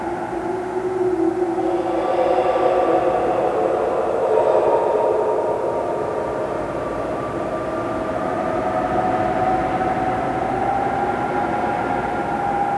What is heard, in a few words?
horror loop